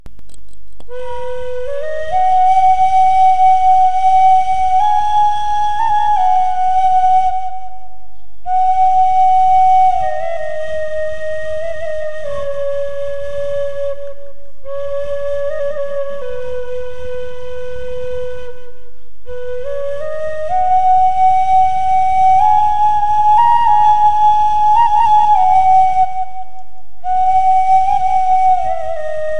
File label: flute